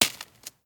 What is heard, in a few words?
Stone Turn